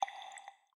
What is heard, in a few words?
Unlock, phone ui; Newman; CM810